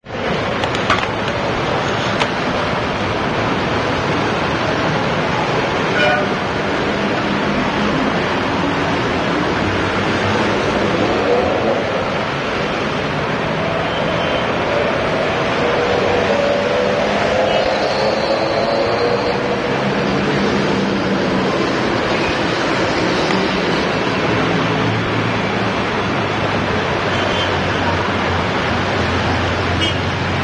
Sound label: Athens Street Noise